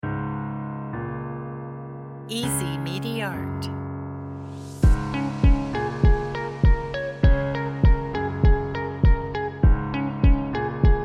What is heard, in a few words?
calm corporate guitar